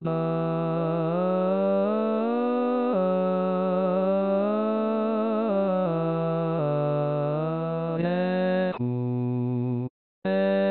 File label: barechu playback